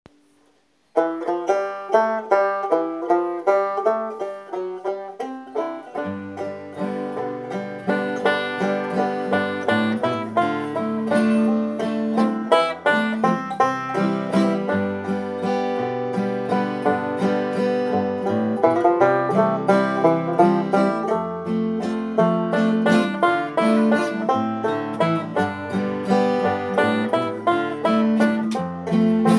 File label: Waltz instrumental; Delta Queen